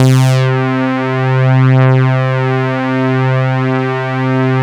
DANCE SYNT, Mostly Leads

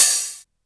hi hat open, Korg Electribe ER-1

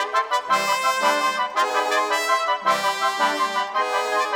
Brass Winds, Orchestral; Sonic Foundry (Sony Creative Software); Orchestral VOL-2

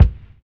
LO FI BD, Drums Dance & Groove

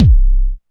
BD SYN, Korg EMX-1

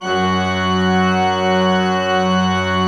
F2, cathedral organ; Propeller Island

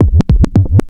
backscratch, scratches; Zero-G; Freak Beats

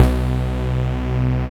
BAS, Eurotech; Sounds Good

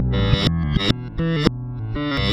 Backwards, Bass, Effected; Bass X

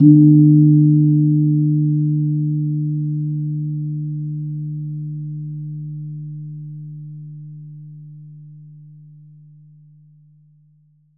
GONG D#3; USB Soundscan vol.60; Asian Traditions; AKAI

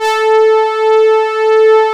STRINGZY A3, synth; Strictly Synthe3; E-MU Producer Series Vol. 6 – Analogia Project

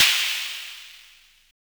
Snare, Univox SR-95

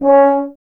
Tuba; Miroslav Vitous - Solo Instruments VOL-2

